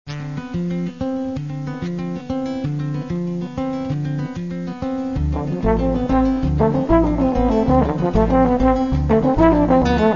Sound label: quiet music silent night